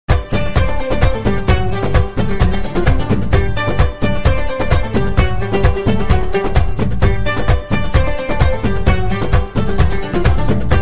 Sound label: hero ringtone